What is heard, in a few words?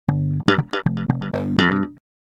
Distorted Guitar; Tecno Spark 40C